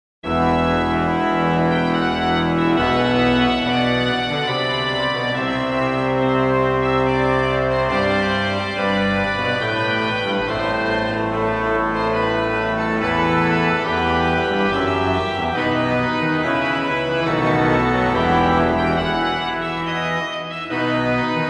Kyrie Grand Choeur; Beauvarlet Charpentier JJ Journal

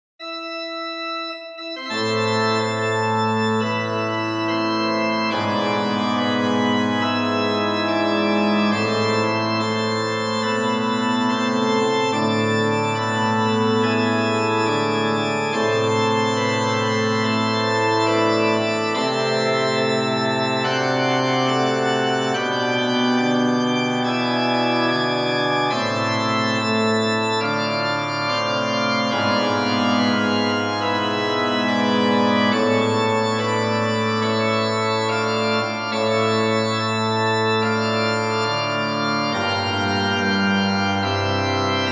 Kyrie Plein Jeu; Beauvarlet Charpentier JJ Journal; Messe Rem

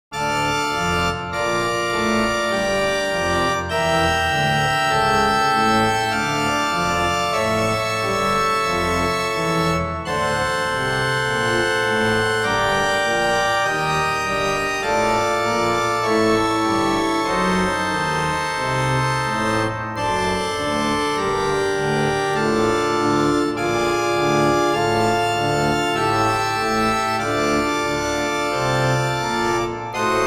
Allegretto Dm, harmonium; P Posthumes; Franck

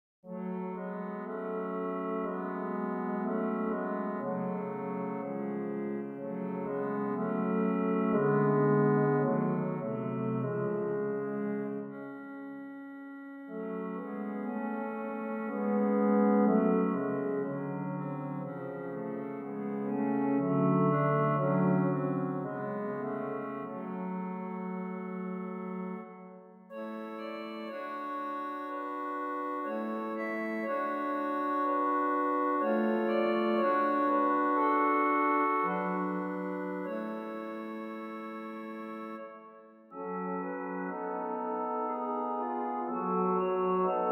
Air bearnais, harmoniums; L Organiste; Franck

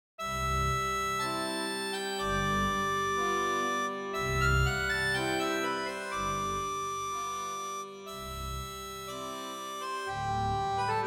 Andantino C, harmonium; P Posthumes; Franck

